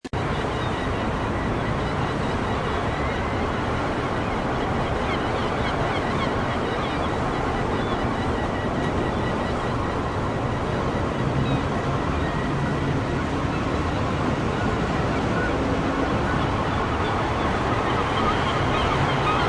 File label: weird seagull echoes